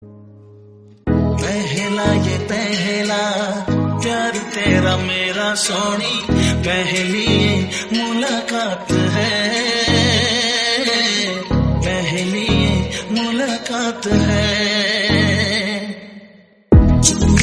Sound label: remix hindi song